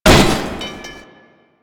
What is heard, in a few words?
Umbrella Stand Destroy; Warframe; NineteenNinetyNine